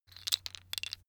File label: tiles wood rattle; objekte; holz